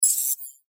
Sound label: subtle tech interface; Atlas Sounds